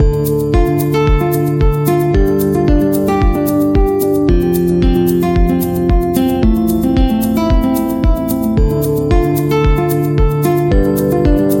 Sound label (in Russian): zatsiklennye melodii dlia gitary loop